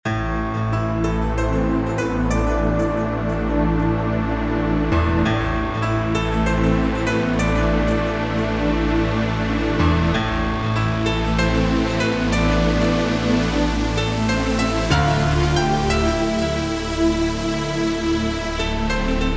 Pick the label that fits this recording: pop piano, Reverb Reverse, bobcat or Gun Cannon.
pop piano